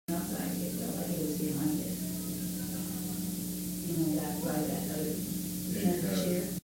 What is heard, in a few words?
singing; goldfield